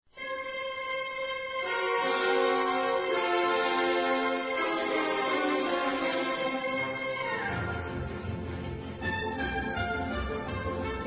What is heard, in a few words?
gallop; Kabalevsky